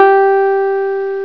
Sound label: synthe